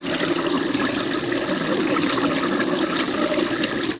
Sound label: sink